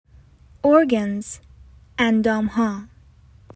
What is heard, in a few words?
Organs